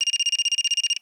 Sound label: Cell Phone Ring; Communications